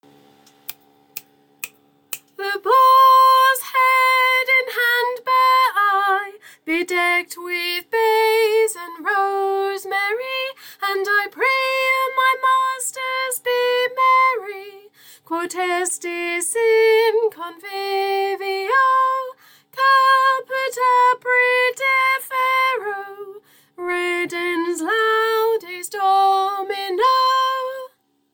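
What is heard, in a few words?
soprano, Choir; Choir Christmas 2023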